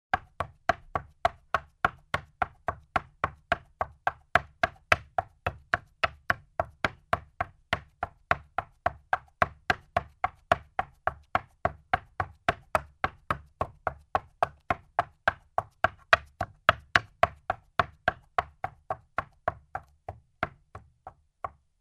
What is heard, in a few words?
horse walks on a wooden floor